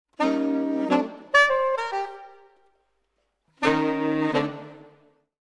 Brass, Jazz for piano

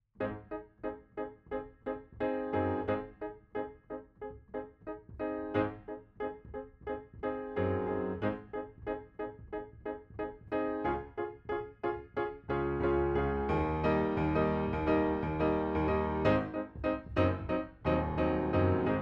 Piano B

